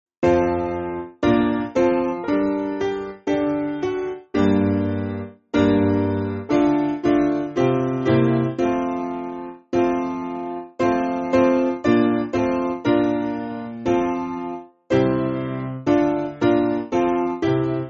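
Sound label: Piano, Music Backing Files for Churches; O Gladsome Light; Nunc Dimittis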